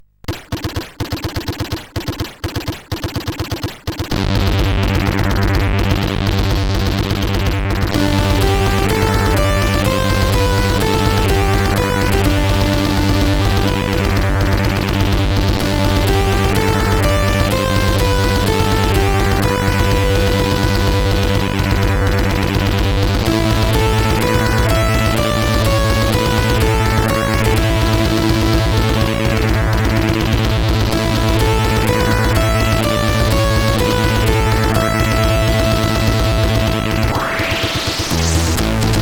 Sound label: War Music, CSG8580R5; SoedeSoft